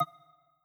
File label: scroll